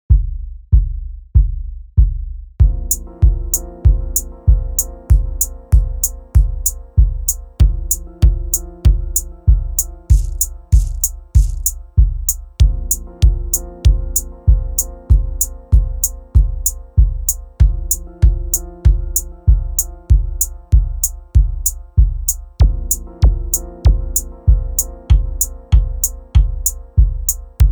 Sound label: Kick click samples; Kick Pack Deluxe